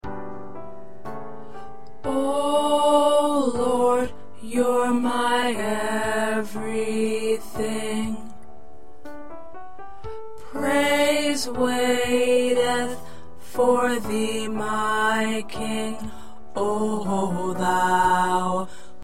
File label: Tenor, choir parts